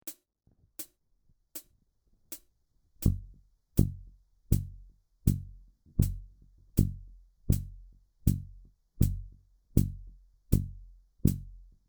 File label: excercise, 4 finger; achim seifert